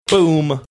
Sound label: saying boom